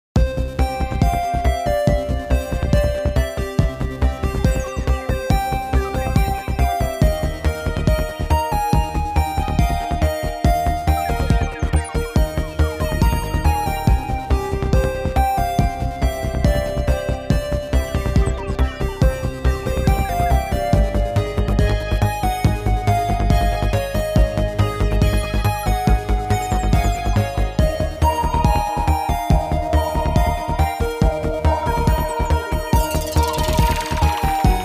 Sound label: Hi Score; Jetpac